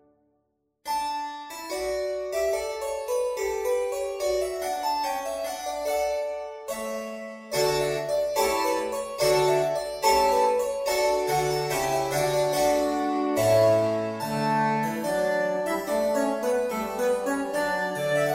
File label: 3 Harpsichords; Masek